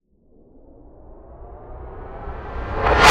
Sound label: Swell Metallic; bottlerocket